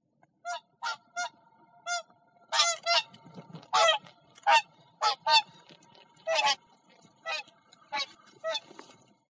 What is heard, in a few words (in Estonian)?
laululuik; autumn; Лебедь-кликун